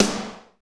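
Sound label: snare, medium techno, Roland; Northstar - Drumscapes Roland; Med. Tekno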